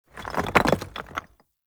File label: Vendor Clay Shuffle Texture; Ambience; Soul Plane